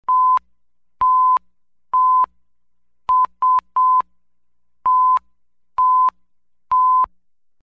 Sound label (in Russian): signal sos na morzjanke